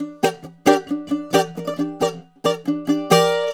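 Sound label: bouzouki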